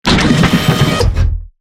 Robotic Movement; SoundsCrate

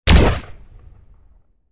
Sci Fi Laser; SoundsCrate

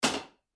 Metal Footfall; soundscrate

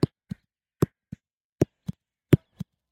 golf ball falling on ground mono; soundscrate

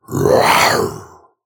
growl; soundscrate

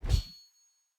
Sword Swing; soundscrate

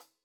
hi hat 14 inch; soundscrate

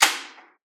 printer closing; soundscrate

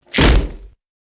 body impact wood

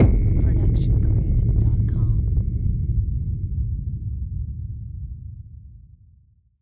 cinematic hit distorted impact; soundscrate

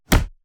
Fight Impact; SoundsCrate

